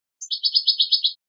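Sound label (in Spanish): padreos corridos, canario; campero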